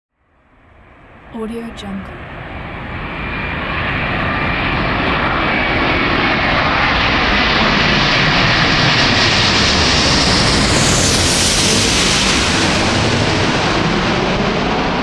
large airplane overhead

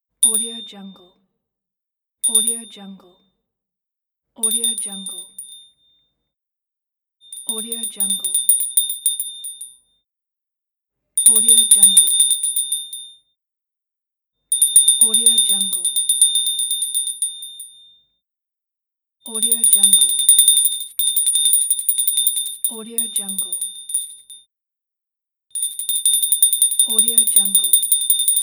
bells

